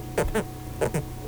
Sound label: Pterodoras granulosus, Amazon Fishes